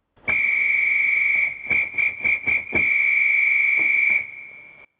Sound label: Traffic Whistle Usa